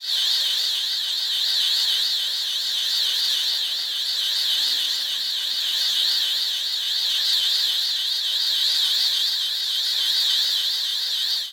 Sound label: alien